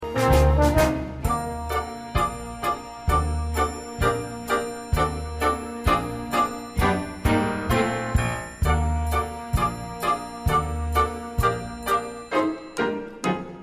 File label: Accompaniment Only; Into the Woods